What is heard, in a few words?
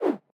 Pop Up Close